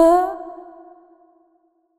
Female Vocals, Wet; Advent Calendar 2017 Day 1

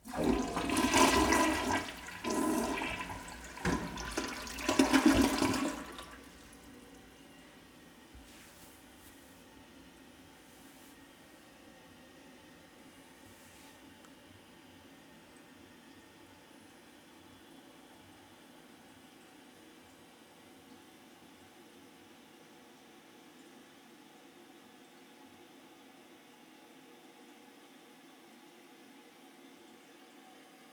Toilet Flush Fill; Fairlight Sound Lib

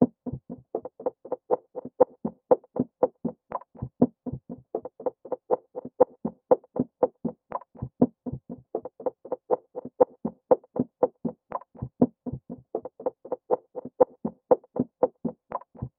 Percussion Loop 120 BPM; Horse; Hip Hop

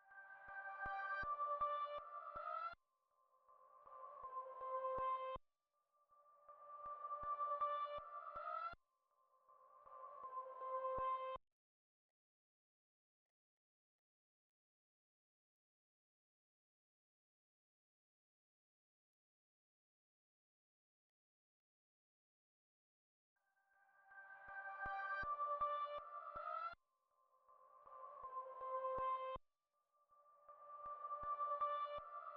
160 BPM G Min Reverse Texture; RnB; Loop Stems; Cymatics; Aroma; 2022 Melody Collection; RnB Pt 1

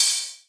Swing Open Hihat; House; Drum One Shots; Hihats - Open

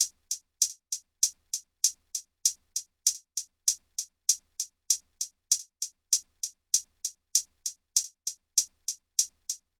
Drum Loop - 98 BPM Hihat; Loop Stems; Cymatics; Vibes II Drum Loop Collection; Trails

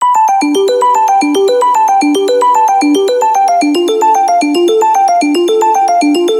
Arp G 150, Melodic Loops, Color Bass; Teardrop; Ghosthack - Color Bass Essentials

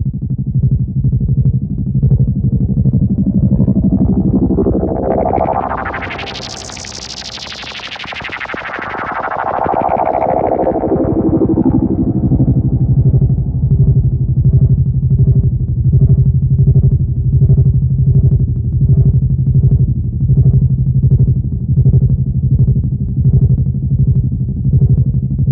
Stuttered Atmosphere C 145 BPM, Psytrance

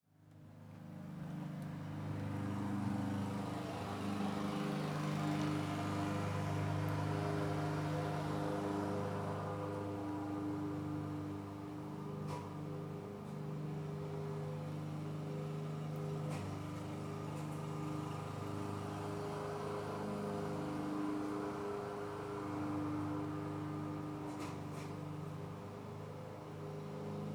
Lawn Mower INT Persp; Fairlight Sound Lib

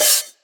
Open Hi Hat, Dubstep Drum Shots; Ghosthack; Demolition; Infernal

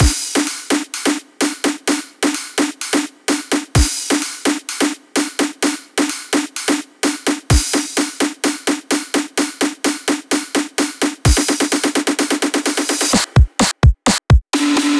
House Buildup Drums 128 BPM; Loops; Cymatics; EDM Starter Pack

